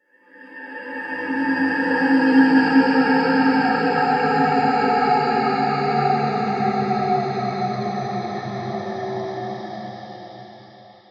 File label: sound design texture ghostly pass, Dark Texture; Sound Design - Dark Ghostly Passing Texture; Strange Tones; McKinney Sound